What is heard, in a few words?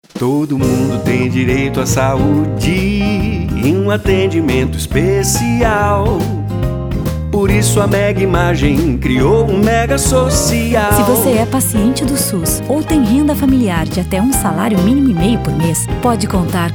Jingle; ZYB; Mega Social